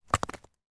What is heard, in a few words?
impact stone large hit ground